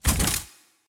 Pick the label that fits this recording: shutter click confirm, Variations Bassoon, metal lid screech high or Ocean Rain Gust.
shutter click confirm